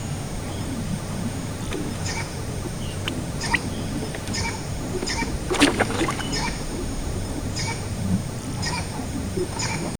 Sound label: various hippopotamuses